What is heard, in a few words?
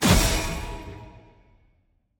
enemy boss teleport in; bloom minigame